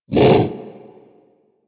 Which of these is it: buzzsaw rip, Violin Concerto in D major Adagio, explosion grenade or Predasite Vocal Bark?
Predasite Vocal Bark